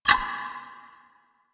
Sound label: Sticky Bomb Detonate; Grineer; Veilbreaker; Vor